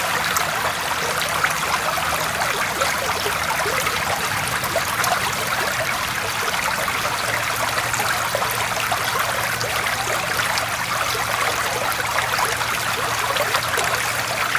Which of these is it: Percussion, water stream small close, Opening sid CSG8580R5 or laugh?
water stream small close